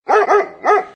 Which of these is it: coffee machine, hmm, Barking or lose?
Barking